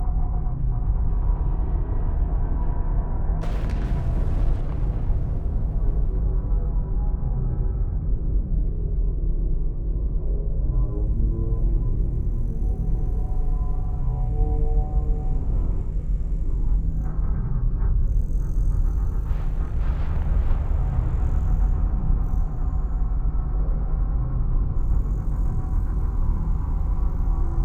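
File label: ambience starbase salvager; Stellaris; overlord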